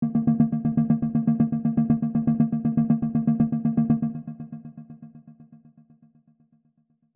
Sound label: Pluck; PatchFactory